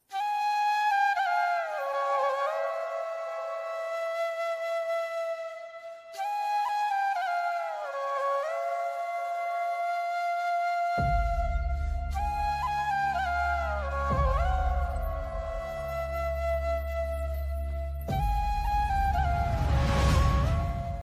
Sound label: Flute Ringtone; Ranangan Marathi Movie